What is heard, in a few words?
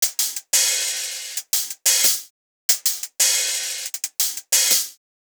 HIHATLOOP BPM90